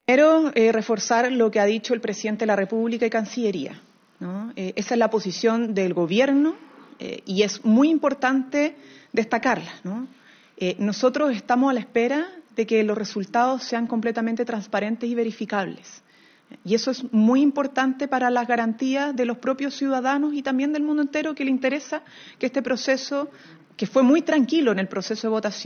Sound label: vocals